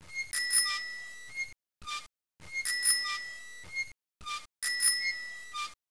BIKE; Mr. Wonder's Greatest Toyshop on Earth; OmniMedia

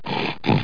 FOUNDRY; landslor

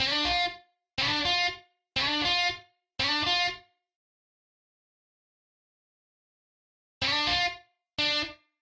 lead guitar; Reaper

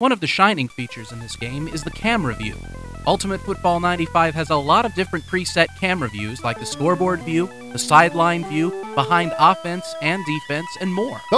foot

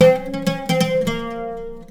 SITAR ETHNO 125; eJay; Guitar

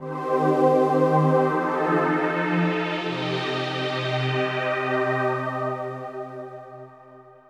SYNTH PAD AMBIENT 160 C, Ambient; Sphere

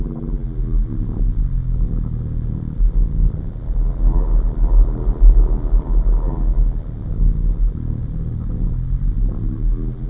hatchery; Far Gate; ENGLISH

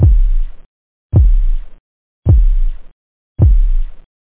flam, techno bd; drums

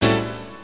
Chord; Jackson's Alert Sounds

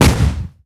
Explo Tight Shock

